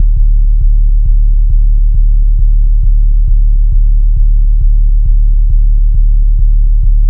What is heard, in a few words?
Bass Loop D 135, Techno; Ghosthack; AC2024 - Day 1 - Techno Sounds 2025